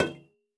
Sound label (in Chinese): 铜灯放置声